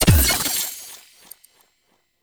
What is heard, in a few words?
Pickup; Warframe; Dark Sector